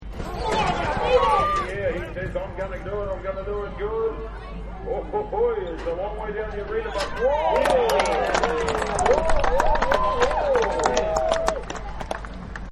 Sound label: Rodeo Starting Gate; Partners In Rhyme